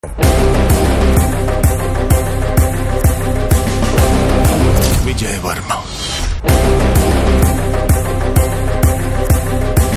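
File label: Trailer background music Ringtone; Wild Dog; Telugu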